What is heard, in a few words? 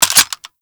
Twin Assault Rifle Reload; Weapons; Grineer; Warframe